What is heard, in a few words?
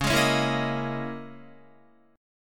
Db Minor 6th Add 9th Db down Guitar; Dbm6add9 strummed; JGuitar